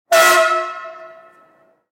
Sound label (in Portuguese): buzina de trem curta